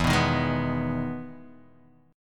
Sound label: G sharp Minor E, chord